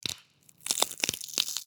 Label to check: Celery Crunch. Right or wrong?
Right